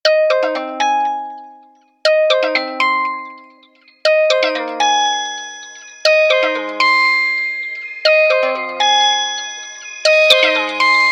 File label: Metal Twist, ringtones; Motorola MOTOLUXE-XT615